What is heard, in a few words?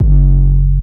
808 medium F#; 808s